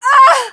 death; mohaa; dialogue

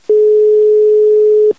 phone tone; xash3d fwgs; induction

